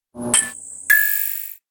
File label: bulb two, notifications; Nothing Phone (1)